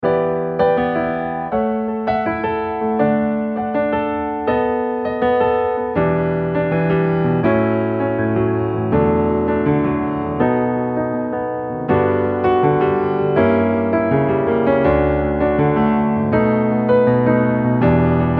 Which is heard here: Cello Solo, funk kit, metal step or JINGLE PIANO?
JINGLE PIANO